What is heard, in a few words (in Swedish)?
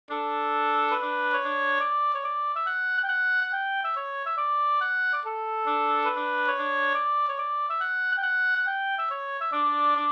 hambopolska